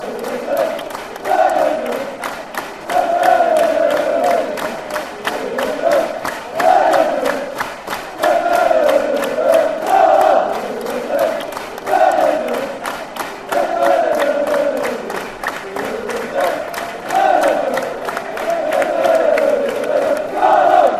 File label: great escape carlisle fc fanchants